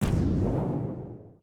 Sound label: Shadow Gate Repel